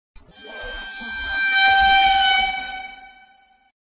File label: warning doors super hard mode; greed; Memes